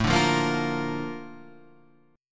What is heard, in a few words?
Bb Major A, chord; Bb/A strummed; JGuitar